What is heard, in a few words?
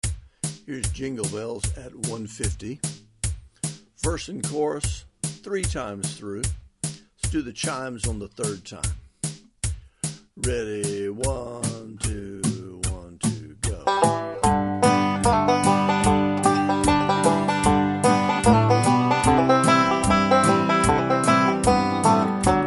jingle bells, banjo